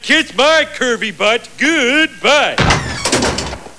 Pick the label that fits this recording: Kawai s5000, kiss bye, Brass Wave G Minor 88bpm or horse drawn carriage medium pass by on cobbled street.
kiss bye